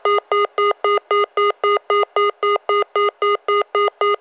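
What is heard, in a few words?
busy channel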